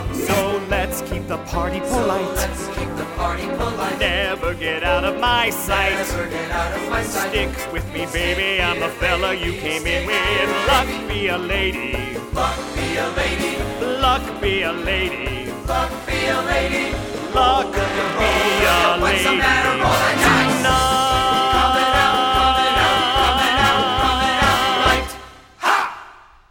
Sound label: Vocal; The School Musical 2024/25 - Guys & Dolls Jr.